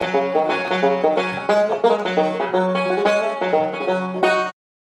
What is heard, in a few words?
banjo